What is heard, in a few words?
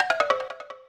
descent